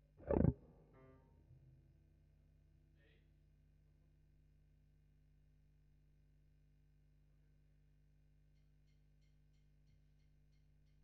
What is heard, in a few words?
GTR B